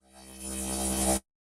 Laser Charge Up; SoundsCrate

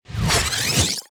rewind; soundscrate

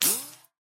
bullet impact ricochet

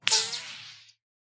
bullet impact ricochet

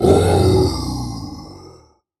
monster growl; soundscrate

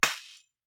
Breaking Jar; SoundsCrate

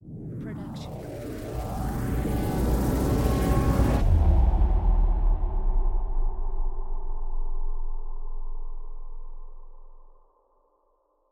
trailer hit psycho stab; soundscrate

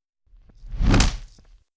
fighting swoosh double kick

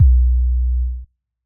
electronic kick long

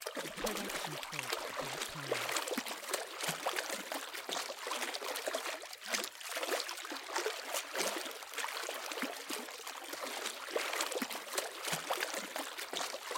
swimming water splashes; soundscrate

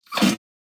Fart Noise; SoundsCrate

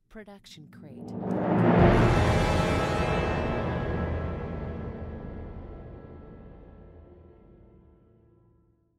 timpani roll brass cluster

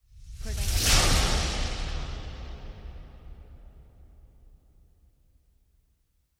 fly by

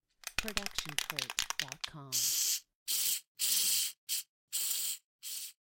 spray paint; soundscrate

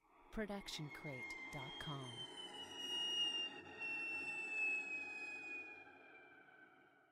Cello Harmonics Long; SoundsCrate

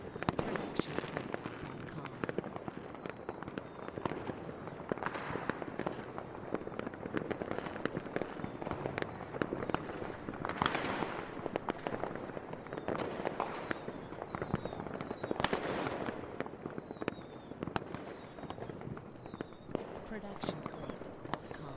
fireworks

